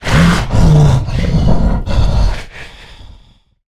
Harpooned Breaths; Evolve; Goliath

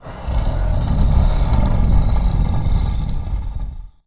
Stealth Breath Idle; Evolve; Goliath

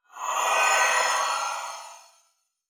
Teleporter Abduction Success; Evolve; Wraith

